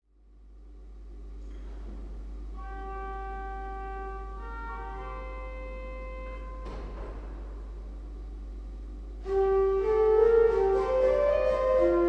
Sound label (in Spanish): pequeña pieza improvisada, órgano